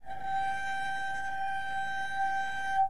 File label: cello whale